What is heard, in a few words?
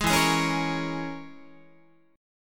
E 6th Flat 5th E down Guitar; E6b5 strummed; JGuitar